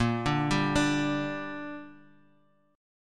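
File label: A sharp 5th chord, Guitar, Open G tuning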